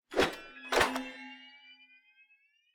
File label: multiplier Up; cashomatic